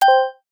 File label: list bullet tick; buttons ui